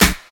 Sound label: juicy snare one shot g key, snare sound tuned to the G note